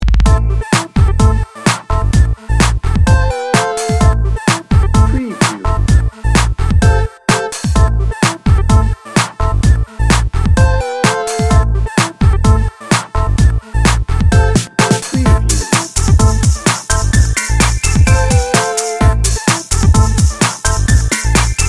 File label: bouncy beats